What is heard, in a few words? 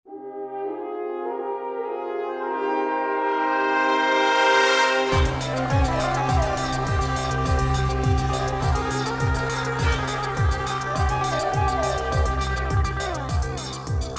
start action; music kits custom